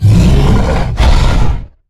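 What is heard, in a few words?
Stealth Attack; Evolve; Goliath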